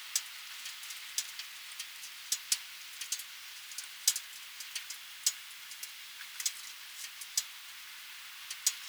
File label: mohole smoldering